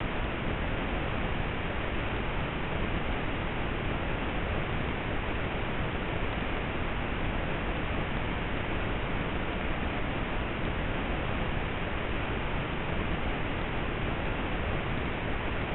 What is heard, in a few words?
pink sound masker